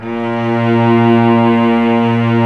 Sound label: ARCO CELLI; OMI - Universe of Sounds